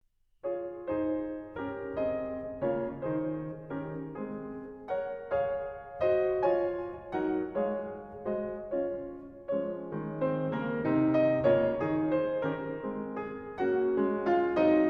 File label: Piano Sonata No 23 In F Minor Allegro Assai; Appassionata